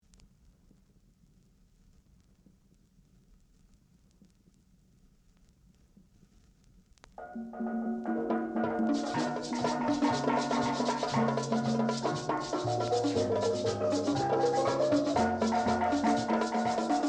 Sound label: steelpan